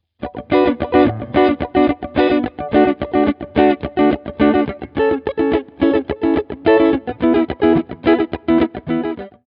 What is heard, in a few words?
iRig guitar clean